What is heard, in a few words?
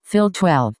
Fill